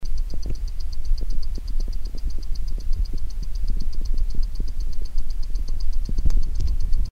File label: tickitickiticki